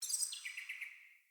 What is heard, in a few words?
Bird Song; Josalfar Bird